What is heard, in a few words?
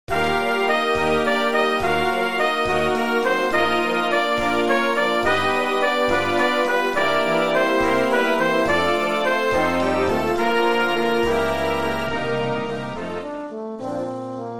The Mighty River - Fanfare